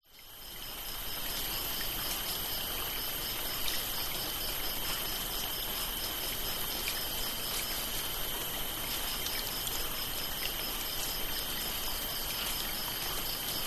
rain light drips on leaves concrete crickets frogs rice field bali indonesia; lukas tvrdon